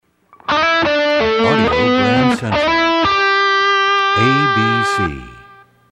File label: Desert Wind, radio jingles